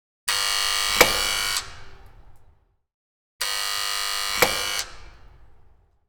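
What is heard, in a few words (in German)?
Türsummer mit Öffnen kurz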